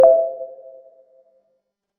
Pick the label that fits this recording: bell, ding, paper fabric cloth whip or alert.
alert